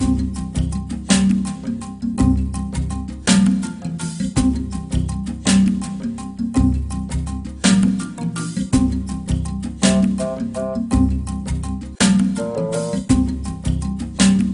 Jungle Level

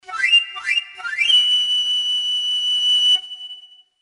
Flute Cue

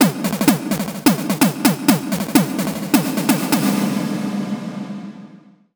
Thunder Drum; Legend of Zelda The Link's Awakening 2019 Remake

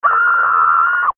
Mannequin Woman Scream

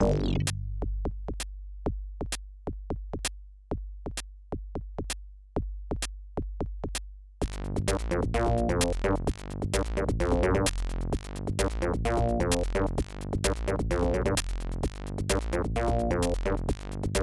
Funk, AdLib; Gene Funk; Blake Stone Aliens of Gold; MS-DOS

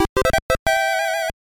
Chance Machine Win; Kaettekita Mario Bros.; NES

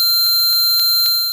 Screech

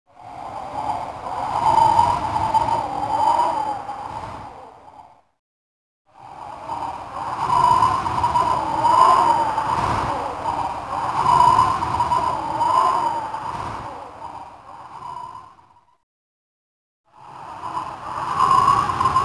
Wind Ambience

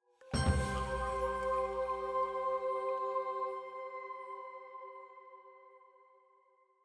item Jingle Full+ Choir Flute C; ori and the will of the wisps

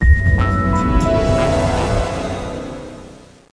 Music Lose

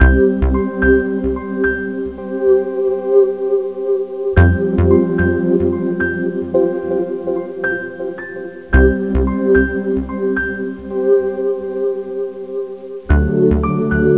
Quiz Loop; Visual Logic Training; NDS

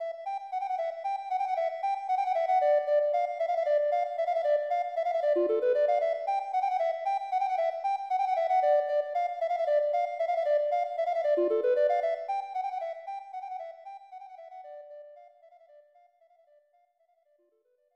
Flute; marvelous; snes; Flute of Indio

